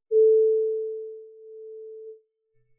ピアノ 基音

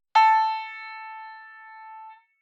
ピアノ 上音

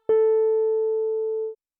エレクトリックピアノ hiA

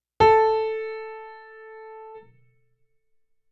hiA ピアノ

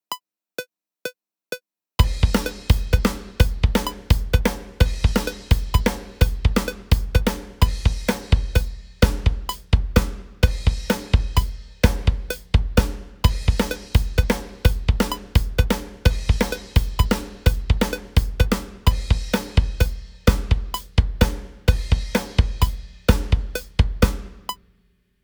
メトリックモジュレーション ドラムリズム

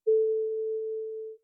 ハープ 基音

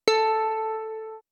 ハープ hiA

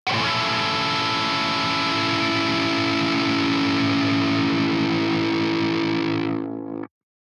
ディストーションギター

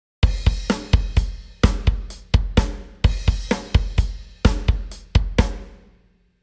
メトリックモジュレーション ドラムリズム

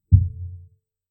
ピアノ 噪音

